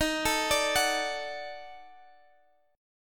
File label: D sharp Minor Major 7th Flat 5th D sharp down